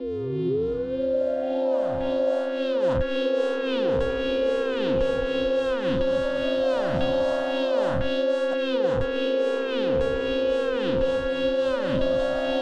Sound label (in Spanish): Loops mutantes